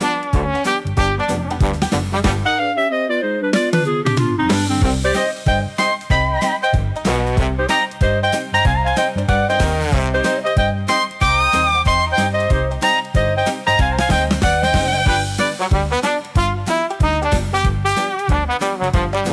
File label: gypsy dixie, ragtime and dixieland